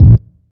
low tone bass drum sample a key, Royality free steel kick drum tuned to the A note; kick drum samples